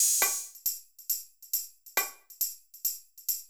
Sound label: Beat, Old Skool House, 137bpm; Belfast